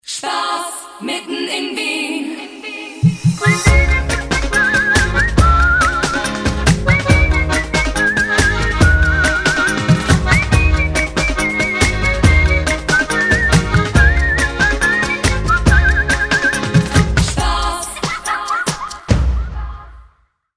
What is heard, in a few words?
jingle instr; prater